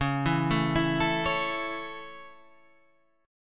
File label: Db Minor Sharp 5th Db Guitar Standard; arpeggiated; Dbm#5; JGuitar